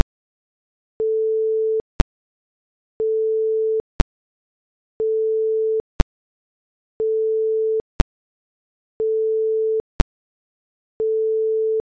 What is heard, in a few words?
alternating click tone